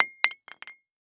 Impact Ceramic Hits Concrete; Impacts

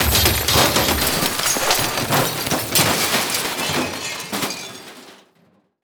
Crash Big Debris Glass Metal Wood; Crashes

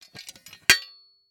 Shovel Metal Compact Shovel Folding Out; Sports

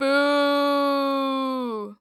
Human Female Boo Long

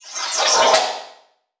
Production Element Title Transition Demonic

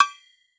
Impact Propane Tank Hit By Copper Stick

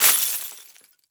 Crash Glass Dumping On Wood; Crashes

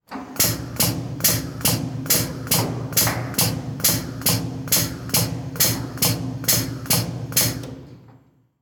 Industry Oil Pump Run

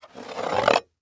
Industry Tool Tire Iron Picked Up From Wood Floor

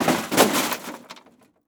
Crash Toaster Tumbling On Pavement; crashes; AdobeCC

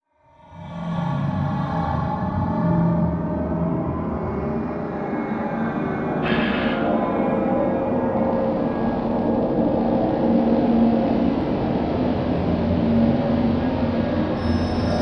Ambient Pitch Drop; AdobeCC; Imaging Elements; Module Production Element Title Imaging

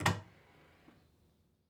Door Cabinet Door Open; household

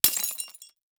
Impact Mallet Hit Glass Debris; Impacts

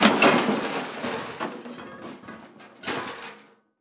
Crash Metal Tumbling In Pile Of Debris; crashes; AdobeCC

